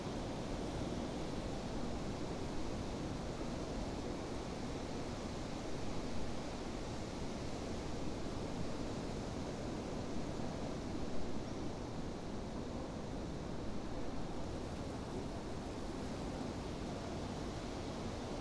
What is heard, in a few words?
Windy Autumn Wind